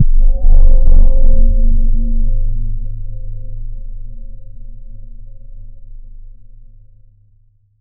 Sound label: mechanical